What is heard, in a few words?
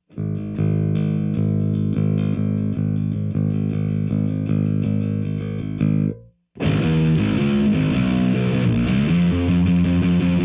bass